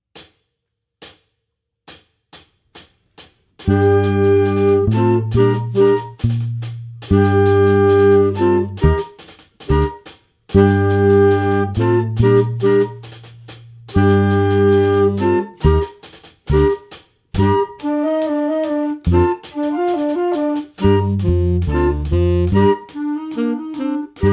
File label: All Instruments